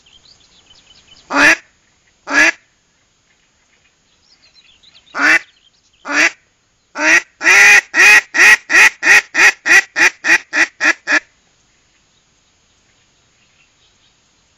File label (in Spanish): llamada hembra solitaria